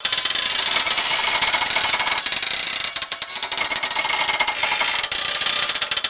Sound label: cable attachment creaking; Max Payne; penthouse